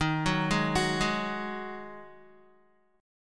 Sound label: D sharp Minor Double Flat 5th D sharp, down, Guitar; arpeggiated; chord